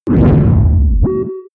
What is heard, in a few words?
whoosh distorted drop drone